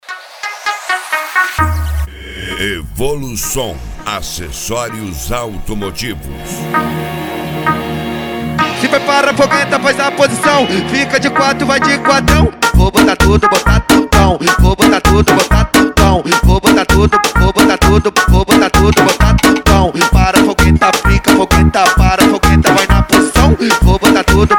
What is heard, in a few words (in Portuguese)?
noia dance